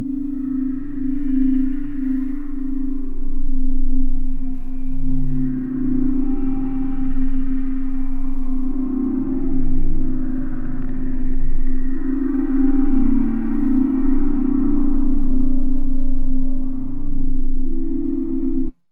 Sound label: mining; orbit